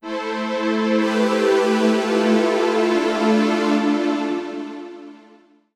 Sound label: SYNTHPAD, 125; Sphere